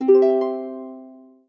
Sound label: harp